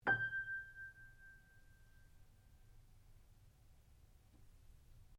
PIANO UNTUNED G5 MF, Baby grand piano left to fall out of tune; Pianos; Musical